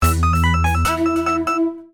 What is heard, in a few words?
Digital, notifications; Huawei C8813D